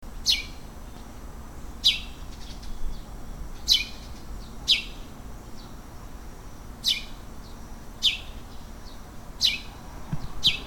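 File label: sparrow; kubo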